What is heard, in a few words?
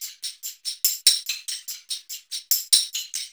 TAMB; Zero-G; Chemical Beats